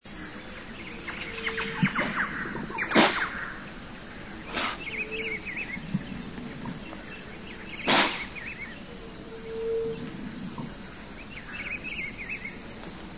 river dolph

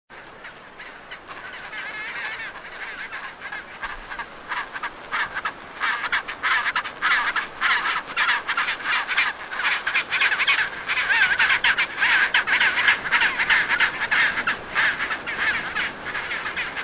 chinstrap

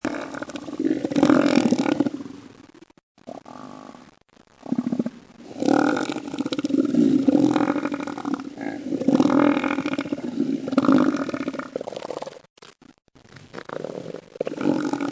southern Elephant Seal

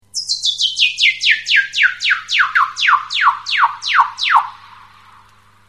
weddell seal

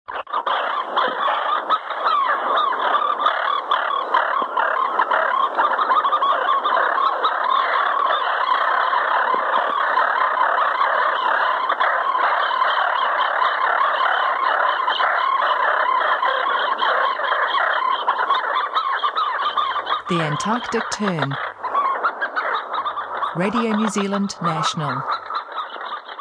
antarctic tern

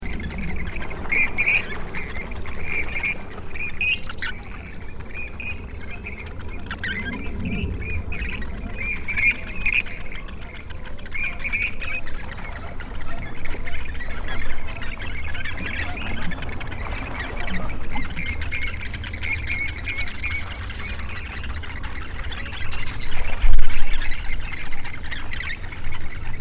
grebe

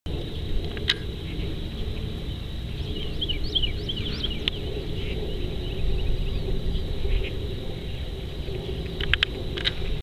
Alligator Snapping Turtle

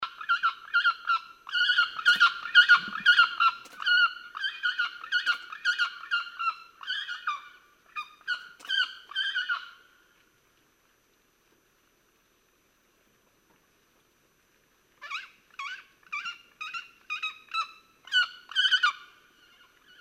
Haliaeetus leucoryphus, Corbett

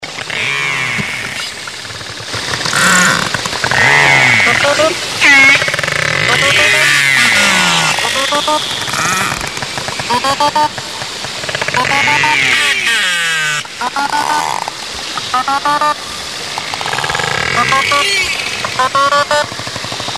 narwhal

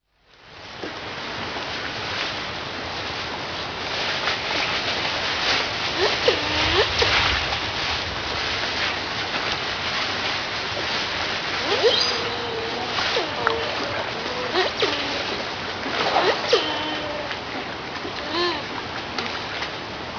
otter

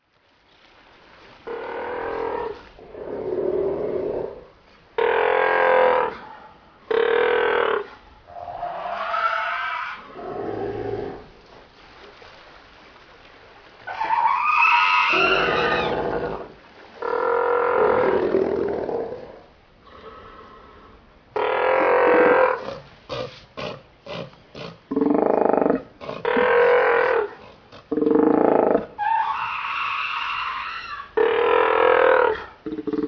north fur seal

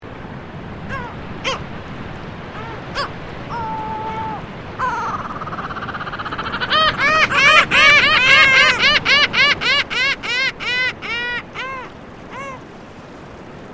lava gull

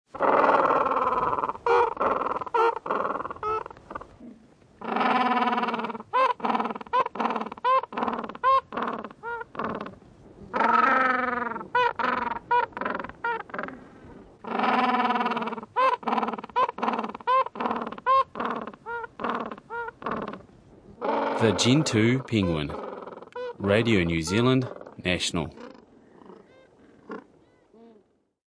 birds gentoo penguin

